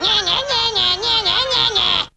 toon; Zero G - Man Machine

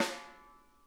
snare, drum kits

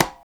PRC, AFRICAN PERC; Roland LA

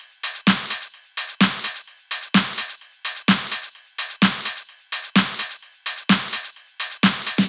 Loops 128BPM, Drumloops

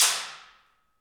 PRC ALMWA, Aluminum Wall; Rhythm Section; FX Perc

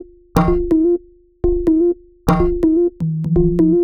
Top A# 125bpm, House Drumloops; 90 s Sample CDs; Zero-G House Fabrik Drumloops; ZG Drumloops

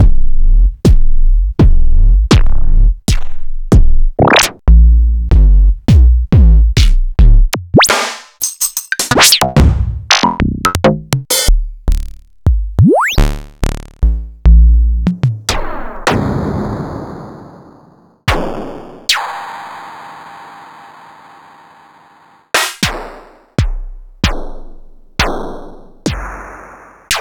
Quasimidi Rave-o-lution 309 dry perc

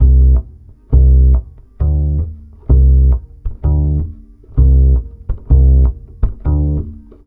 BASS, 132, Balkanish Traditions; USB Soundscan vol.63; AKAI

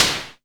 Perc Noise Short, Tama TS-206

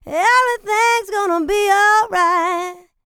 LICKS, Vocal R&B & Dance; ILIO; Vocal Planet

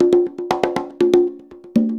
120 CONGAS, Percussion Loops; USB Soundscan vol.36; AKAI

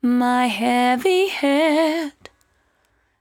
74-5bpm B, Velvet Vocal; While U Could; Zero-G

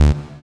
SYN POLY; Sound & Vision - Gigapack I CD 2; JD 800/990

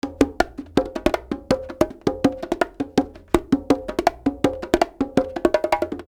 Live Perc Riffs; Jungle Frenzy 1

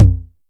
TEKK, Electric Tom, Yamaha DTXpress

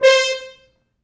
Horns stops

